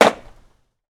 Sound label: land